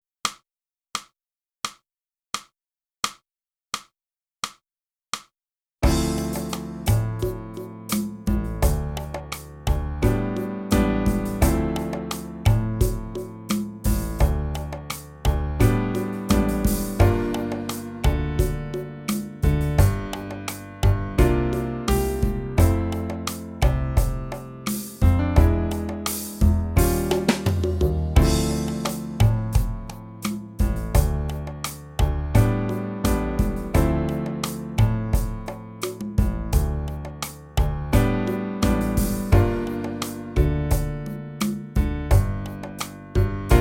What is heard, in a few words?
clarinet, golden sand; Boosey Woodwind Method: Clarinet (Book 2)